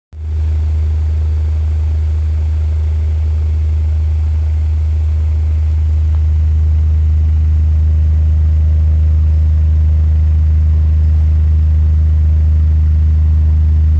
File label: fan